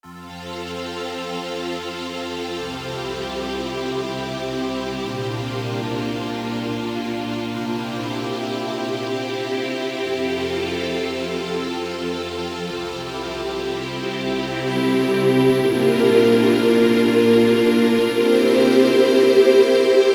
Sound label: Amber, Korg Radias-R